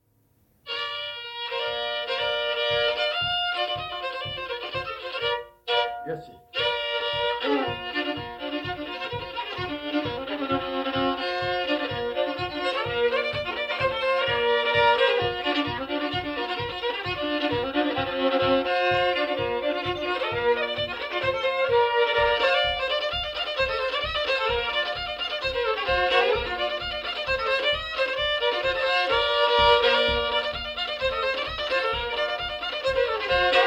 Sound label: Soldiers Joy fiddle; Hobart Smith